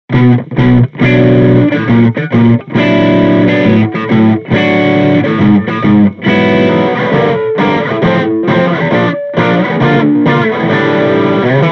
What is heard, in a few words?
Mini Rox Mic Inside Jensen C12N Overdrive Les Paul